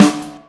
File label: snare, drums; virtual drum sounds; custom drums